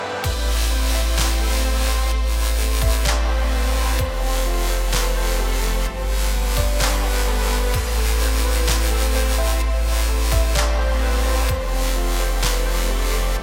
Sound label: Chill